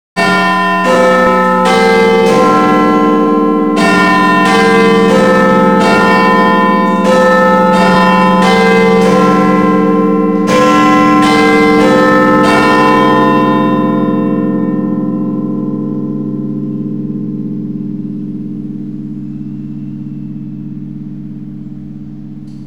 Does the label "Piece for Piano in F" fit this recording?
No